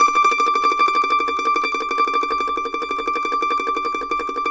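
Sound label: mandolin tremolo D6; Sampleheads; Whole Lotta Country 1 & 2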